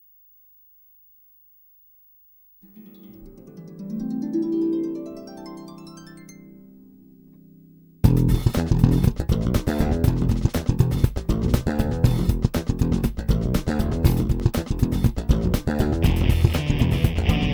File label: k rock slap